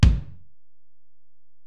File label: Ac BD, Alesis DM-PRO; 300 Drum Machines